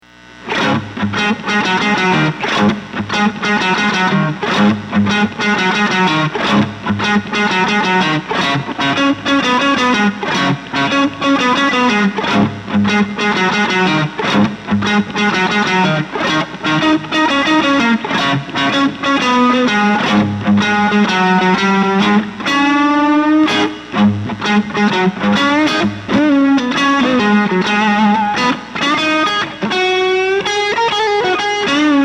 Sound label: alkaline, pickups